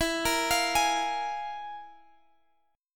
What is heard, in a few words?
F Minor Major 7th Double Flat 5th, E